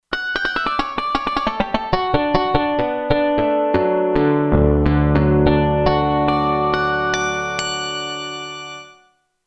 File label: Dist Harmonics, Kurzweil K2500X; Factory Programs